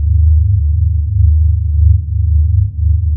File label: impulse loop engine; Earth and Beyond; EA Games